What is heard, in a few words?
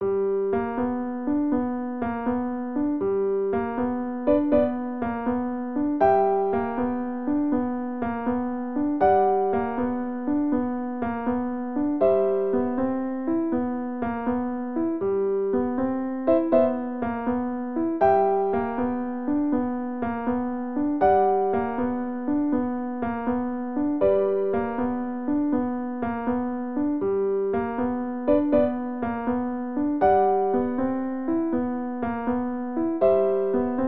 Train Ride Piano; Animal Crossing